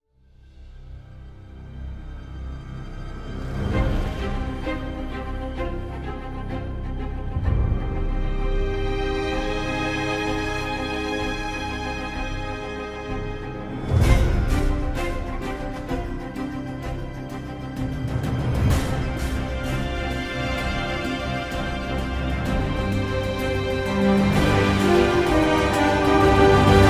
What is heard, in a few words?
treasure hunt music Best adventure movie music